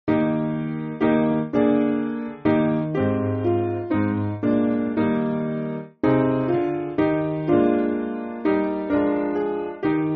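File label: Piano, Music Backing Files for Churches; Father In High Heaven; Evening Song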